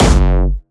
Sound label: Jumpstyle Kick F#1; vengeance essential club sounds-3; VEC3 Bassdrums